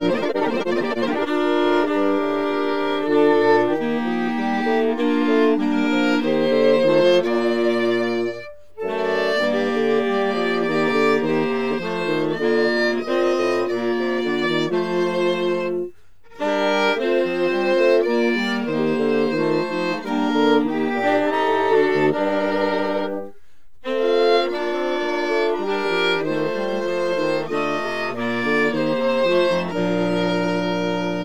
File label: Bach Chorale Scorealign resyn